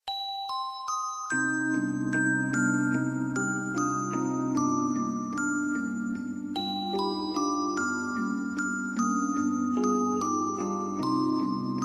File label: Locket